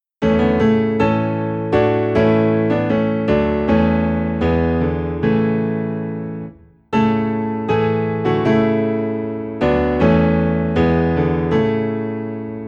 Piano Low in A

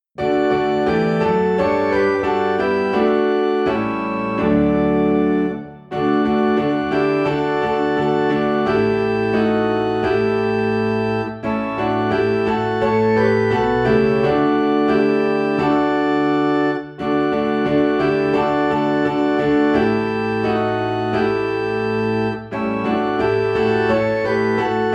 Piano and Organ in D; Count Your Blessings

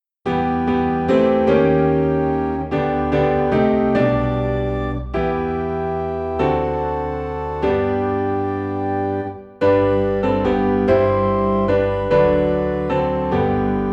Piano and Organ in G; Jesus Is All the World to Me

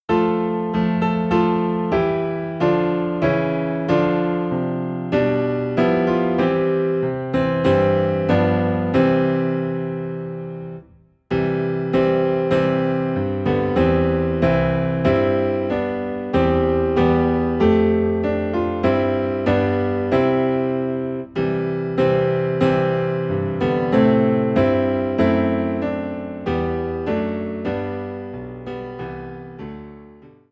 Piano Low in C

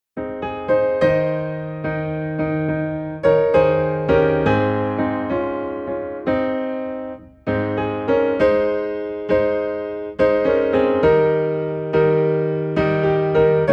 Piano in A Flat; I Will Sing of My Redeemer

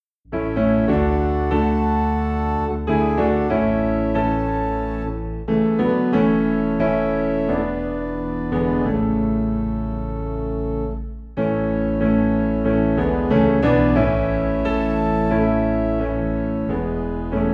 Piano and Organ Low in D; Savior Like a Shepherd Lead Us

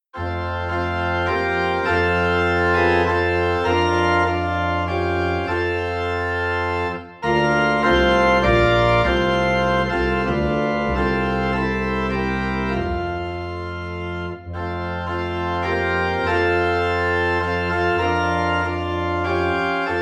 Organ in F

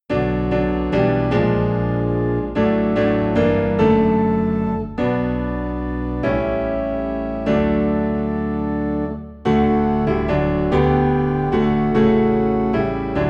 Piano and Organ Low in D; Jesus Is All the World to Me

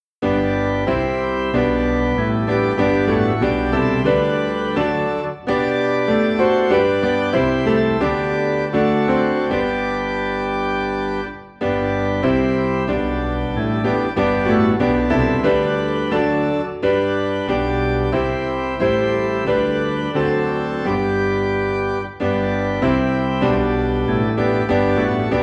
Piano and Organ in G; Guide Me O Though Great Jehovah

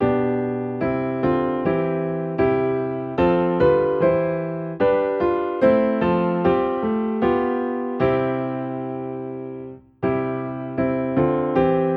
Piano in C; A Charge to Keep I Have

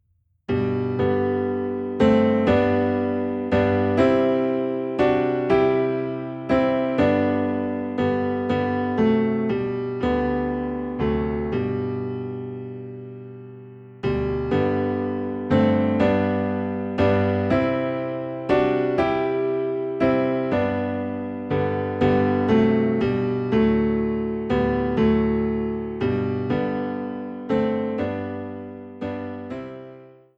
Sweet Hour of Prayer, Piano Low in G

